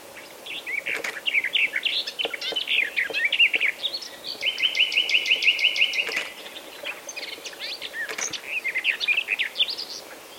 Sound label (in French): fauvette jardin rossignol